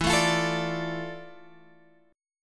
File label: D Minor E, chord; Dm/E strummed; JGuitar